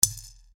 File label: shaker